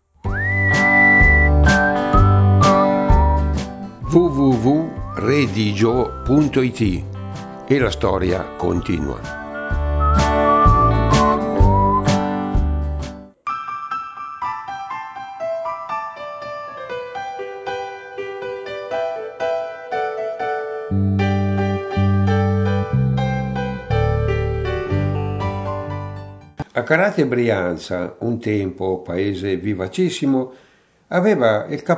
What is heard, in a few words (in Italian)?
dialetto canzoni